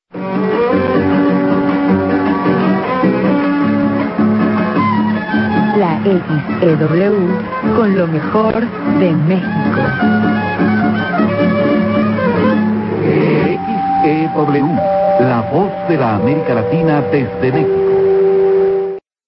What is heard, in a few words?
interval signal; mex xew